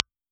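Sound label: impulse response STC4033 Ribbon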